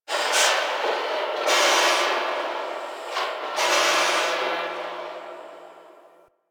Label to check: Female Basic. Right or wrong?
Wrong